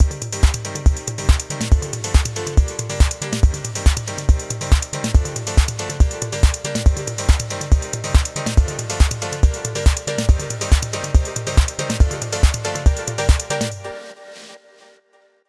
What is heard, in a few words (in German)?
Beat mit FX Korg M1